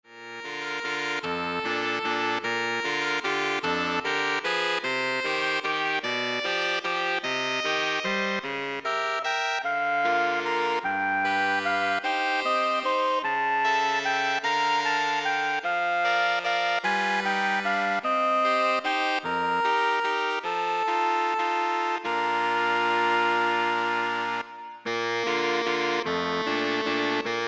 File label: Saxophone Ensemble